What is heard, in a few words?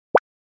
btn; ludo